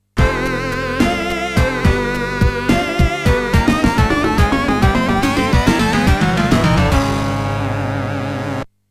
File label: game over; sonic 16bit; sonic3